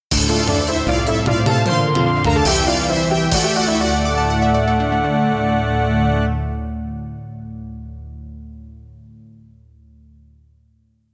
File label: Stage Clear - Midi Roland CM-64 Version